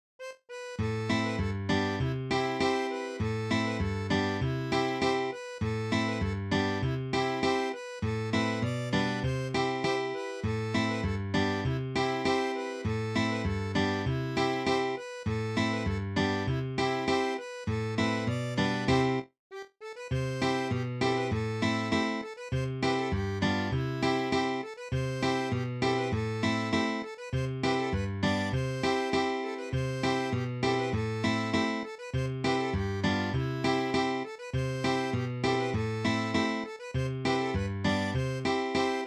scottish simple; a jean durand

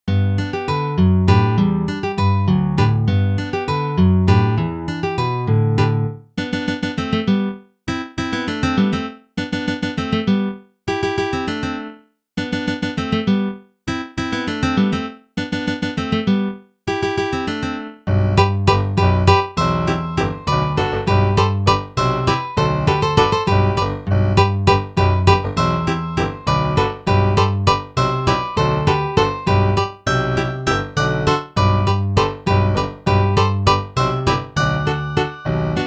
avec violoncelle; carta a l exili

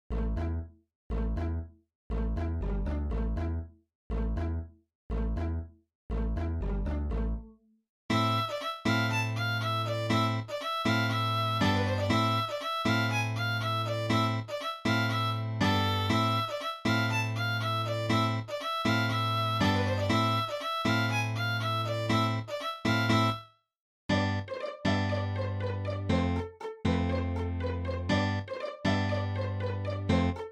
bourree; tradosphere 2023

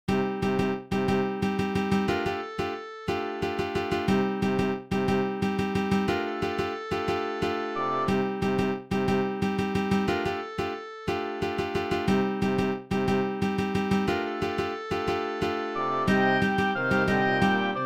fanfare guitare; les conscrits sont la

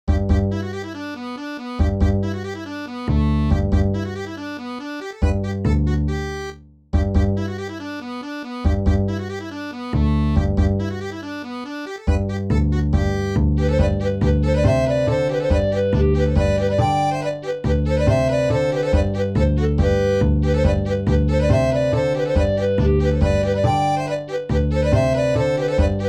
john ryans polka sol 2 voix

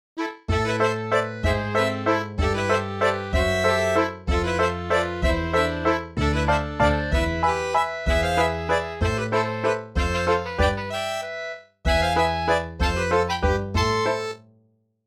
bourree; colognac

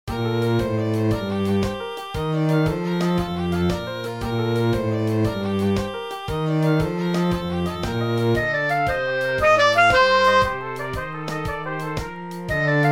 batterie; chapelloise des banquiers

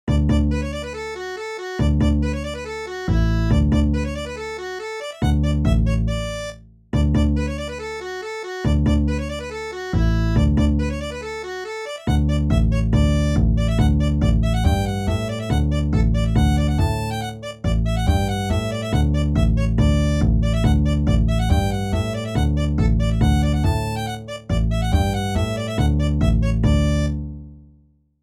polka 1 voix; john ryans

